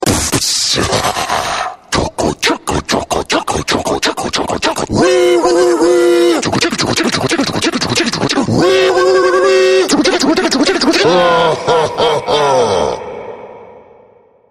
zombie ghost train

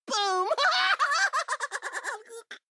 boom laugh; Junkrat

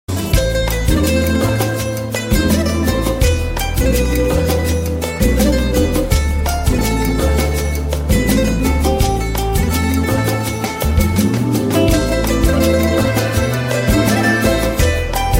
softtune

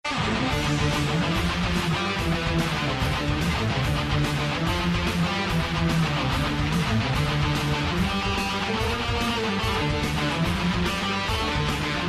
Metal Guitar

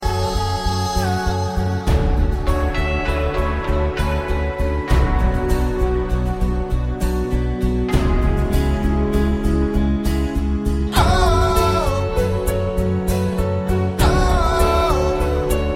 instrumental, ringtone; blues

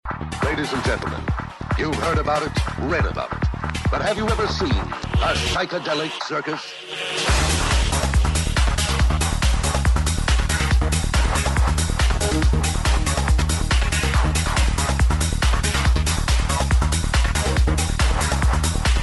Psychedelic

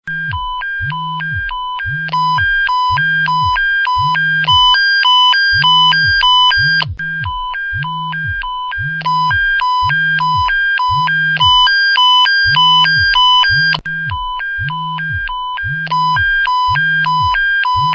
fire alert

